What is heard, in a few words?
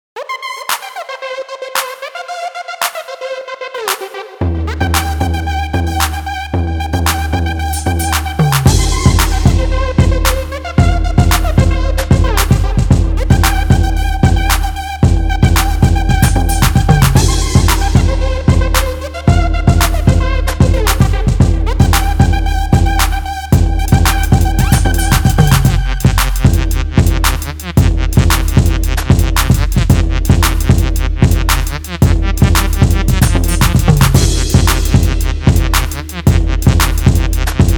Instrumental